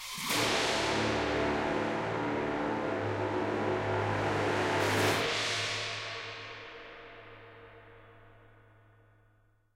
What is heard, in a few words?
Escape Stinger; Forbidden City